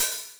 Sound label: Hat Synth; osu